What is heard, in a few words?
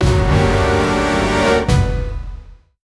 epic tamil heroic theme